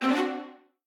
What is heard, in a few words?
attention Money Bag; robin hood